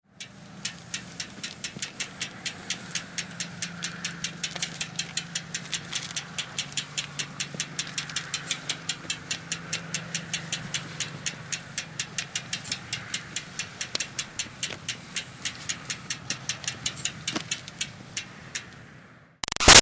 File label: Bicycle Retro Cruiser Push & Walk; Bicycles; Vehicles